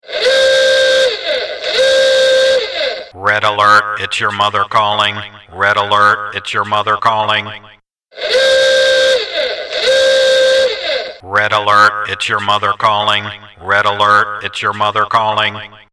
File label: red alert mother calling ringtone